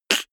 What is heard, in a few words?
beatbox snare clap damped